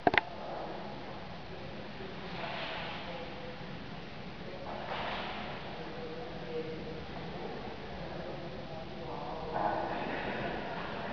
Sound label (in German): Welle Holzspirale